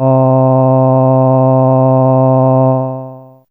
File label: Bass C4, Humans; Fairlight CMI IIe